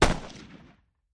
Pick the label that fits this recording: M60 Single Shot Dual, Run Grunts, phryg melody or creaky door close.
M60 Single Shot Dual